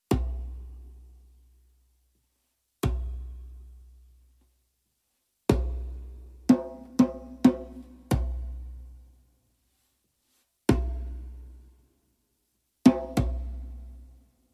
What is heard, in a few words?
Djembe Extravaganza